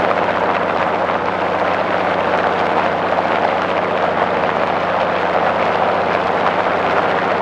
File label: engine plane far; War Thunder